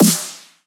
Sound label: Electronic Snare Drum